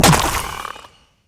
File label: Shrimp Lantern Destroy; Creatures; Baliq